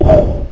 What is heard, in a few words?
pain